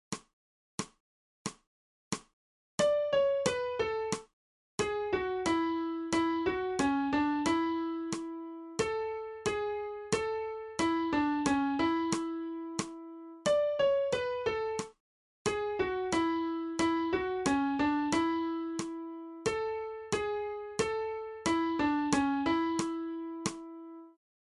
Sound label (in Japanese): 新フレットレス